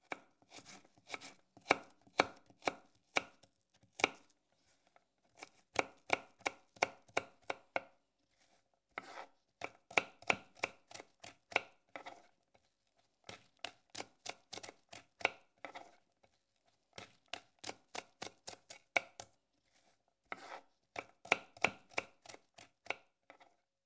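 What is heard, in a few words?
chopping salad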